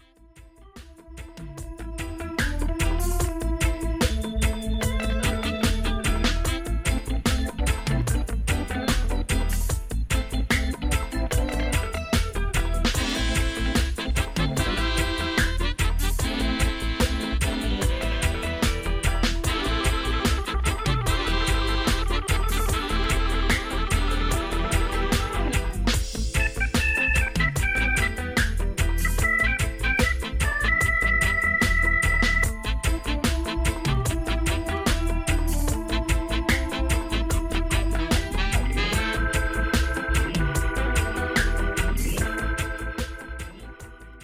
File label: African Organ